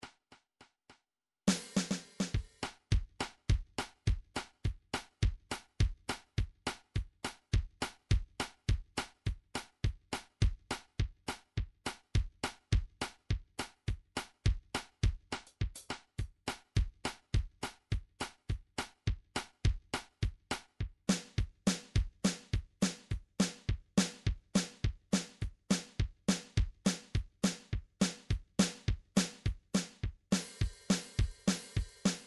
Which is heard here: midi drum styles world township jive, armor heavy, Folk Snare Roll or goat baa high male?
midi drum styles world township jive